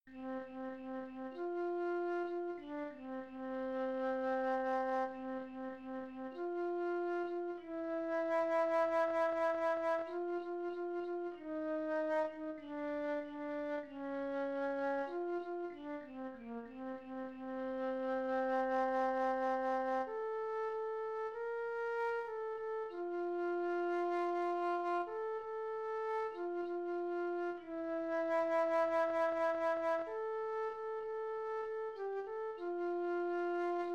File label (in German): Flöte